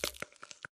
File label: Grab Bone; Lethal Company